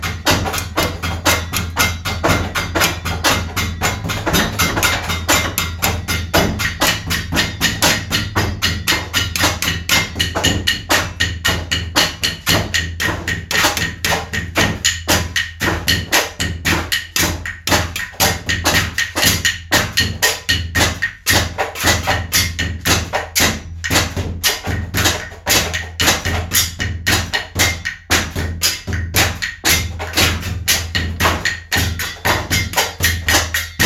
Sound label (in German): klangraum baustelle